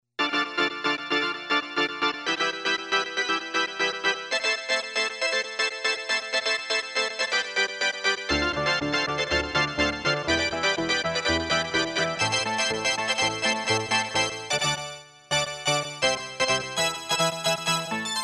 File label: Synth 1, Casio CSM-1; Tones